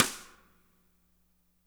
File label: MED SNR, TRASHY KIT; Spectrasonics; Burning Grooves CD2